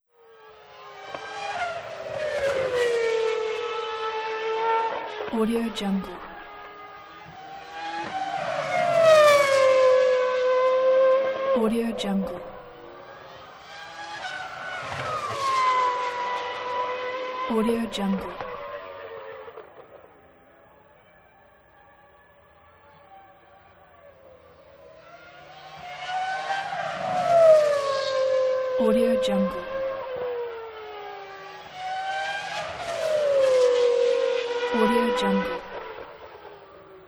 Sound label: formula 1 cars into corner